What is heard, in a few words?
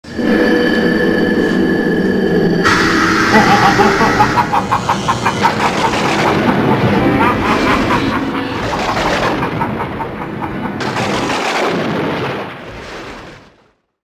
coffin; halloween